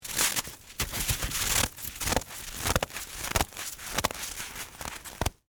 Snowball Make, Weather, Snowball, Make, In, Hand, Long; BlastWave